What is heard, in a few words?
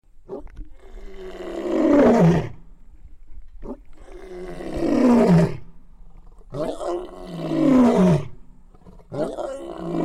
lion